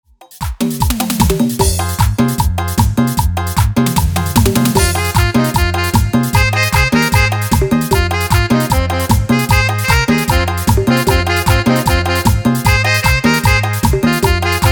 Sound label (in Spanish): INSTRUMENTAL, BPM 152